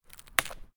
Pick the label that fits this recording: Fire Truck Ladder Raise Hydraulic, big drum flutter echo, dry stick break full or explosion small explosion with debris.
dry stick break full